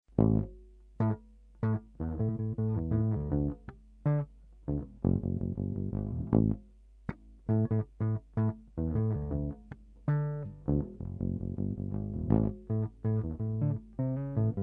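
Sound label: 1969 EB3, bass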